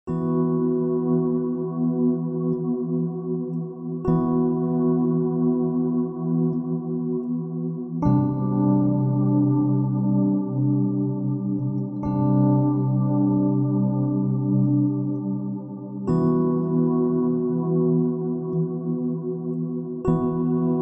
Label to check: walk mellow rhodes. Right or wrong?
Right